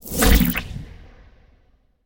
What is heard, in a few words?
webground fire; arachne; smite; arachne skin9